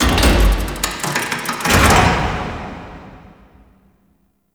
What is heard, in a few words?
Connector Shrine Deactivate; Ambience; Gameplay; Warframe; Entrati